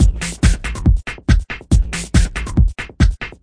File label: 140 bpm jumpy scrunchy techno, drum loops and breaks